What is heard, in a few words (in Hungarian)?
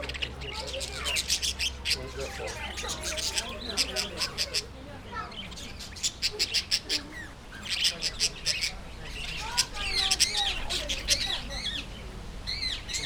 jobbra látogatók, papagájok; Győri Zoo 2014